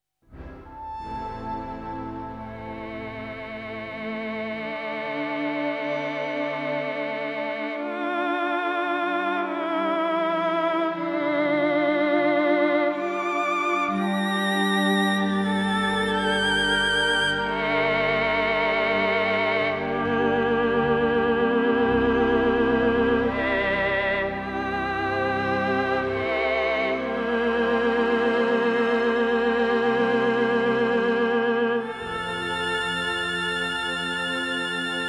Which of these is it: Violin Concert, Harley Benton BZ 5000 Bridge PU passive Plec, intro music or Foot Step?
Violin Concert